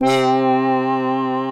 CZ Synthestra; Retro Synths; Ableton; Factory Packs; Phazeform Vol 1

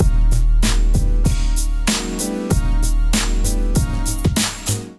groove 96 bpm; MIDI Clips; Ableton; Factory Packs; Beat Tools

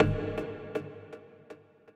Dark Hit, Percussive

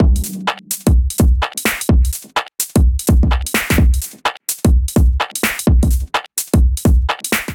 Beat 127 bpm; Ableton; Factory Packs; Skitter and Step; Ruffin

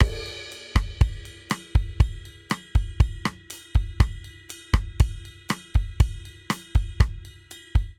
Bossa Nova Verse 120 bpm; 4 4; MIDI Clips; Ableton; Factory Packs; Drum Booth

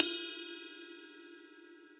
RIDE 22 cup tip

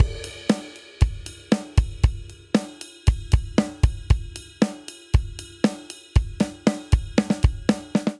Straight Rock Chorus 117 bpm; 4 4; MIDI Clips; Ableton; Factory Packs; Drum Booth

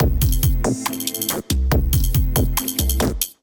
Canopolis Kit groove 140 bpm; MIDI Clips; 133 - 151 bpm; Ableton; Glitch and Wash

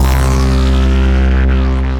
Deep Impact Lead, soaring leads; Ableton; Mood Reel

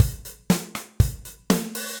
Kit Deep Snare Dynamics, Drums; Standard Kits; Ableton; Factory Packs; Session Drums Club

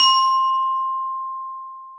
Glockenspiel Plastic Hits; Ableton; Orchestral Mallets

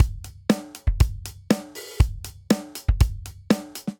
32 Pad Kit Rock, Drum Kits; Multisampled; Ableton; Drum Booth

